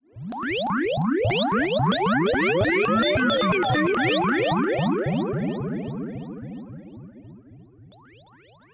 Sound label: Virus Drop; transition